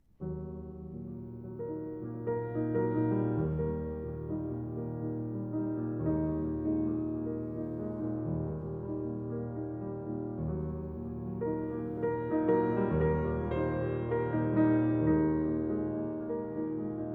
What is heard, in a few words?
cello sonata in g minor